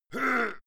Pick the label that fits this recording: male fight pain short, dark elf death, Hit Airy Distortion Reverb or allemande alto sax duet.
male fight pain short